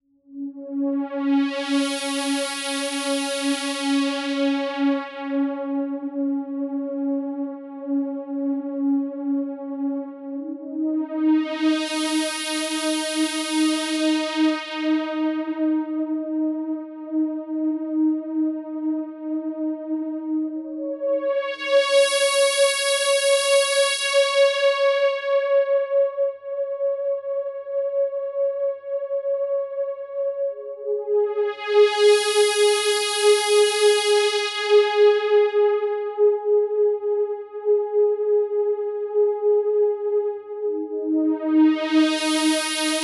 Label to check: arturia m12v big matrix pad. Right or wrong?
Right